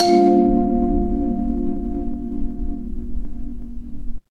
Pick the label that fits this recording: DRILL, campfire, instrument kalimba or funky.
instrument kalimba